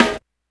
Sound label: snare, Hardcore Breakbeat; Big Fish Audio; Hardcore Breakbeat Action; Rhythms 4 Life 151 Ebmin